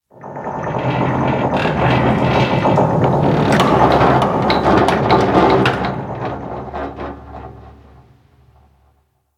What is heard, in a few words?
Ship High Metal Creak; Warframe; Corpus Ship Remaster